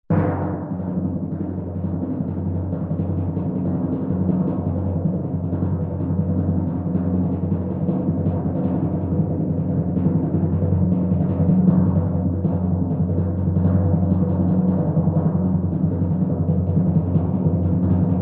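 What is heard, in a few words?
sounds with an effect of completion, ending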